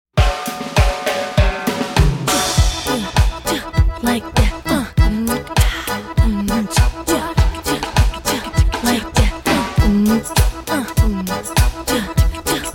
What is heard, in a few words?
Klezmer Band